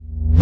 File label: REVERSE SD, Drums; USB Soundscan vol.11 - Drums Dance & Groove; AKAI